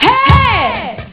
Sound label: fall; shuffle-run